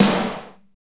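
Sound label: SNR snare, Techno Rock; Northstar - Drumscapes Roland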